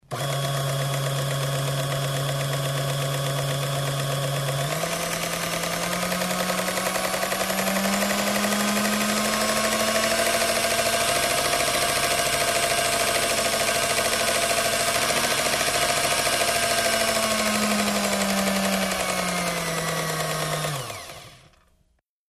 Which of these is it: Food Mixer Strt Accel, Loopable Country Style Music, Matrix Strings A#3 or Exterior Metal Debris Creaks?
Food Mixer Strt Accel